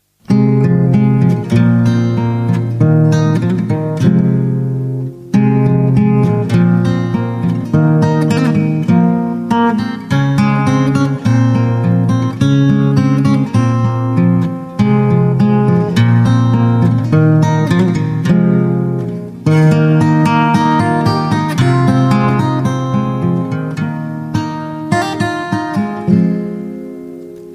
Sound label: acoustic guitar